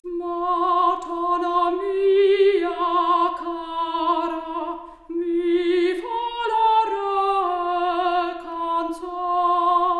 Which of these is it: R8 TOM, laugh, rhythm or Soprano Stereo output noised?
Soprano Stereo output noised